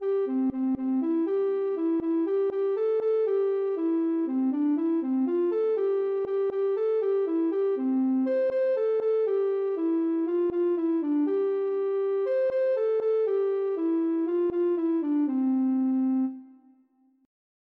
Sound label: ocarina